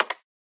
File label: UI label Count Plus; Enhanced Immersion (NO ITEMS OVERRIDE)